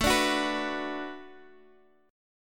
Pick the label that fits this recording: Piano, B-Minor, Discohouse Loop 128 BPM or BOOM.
B-Minor